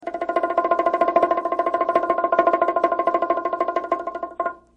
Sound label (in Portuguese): bongô; instrumentos